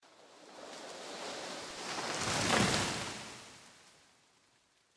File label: tree fall; ambient; minecraft; Remastered